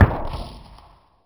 turret fire distant; hl1 npc

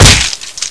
headshot

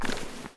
melee lift; arccw

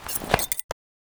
knife push draw; arccw go

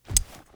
ursus flip, knife; arccw go

